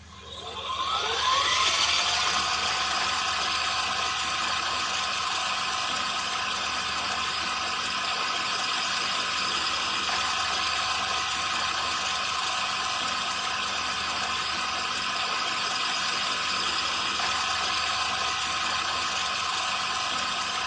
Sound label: Forward Rewind In, Glitch Noise